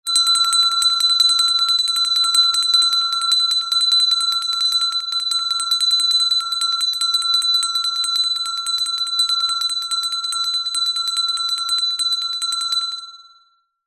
bell small hand ring long; zapsplat

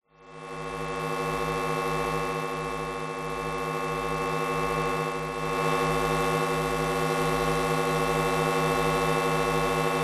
electromagnetic power station power supply

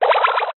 science fiction transmit error burst; zapsplat

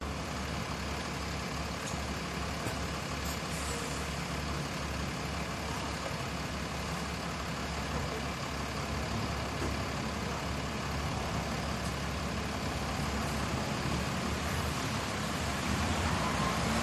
ext manchester bus terminal

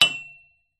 impact metal pole small

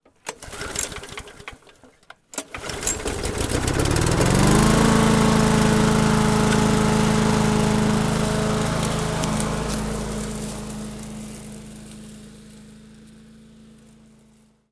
lawnmower petrol 4 stroke start away; household

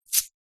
animals dog leash metal chain place into hand; zapsplat

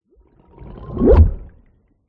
Cinematic Underwater Whoosh

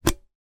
household kettle cordless lift from plastic base

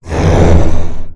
Demon; little robot sound factory

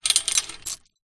industrial metal chain attached to pole movement; zapsplat

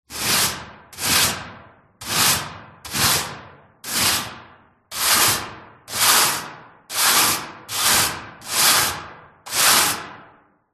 industrial sweeping out metal trailer broom

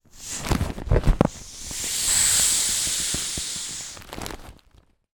lilo rubber ring squeeze deflate

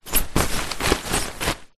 tent movement hand on side wall inside sheet; foley

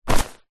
jacket safety thick fluro hi vis pick up; zapsplat; foley

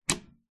air fryer oven door open; zapsplat; household

